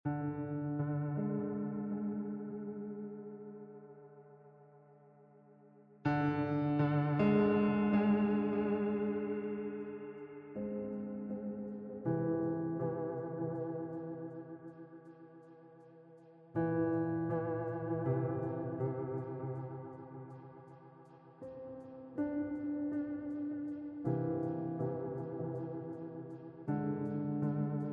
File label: fear whole tone limbo; The Witcher Hunt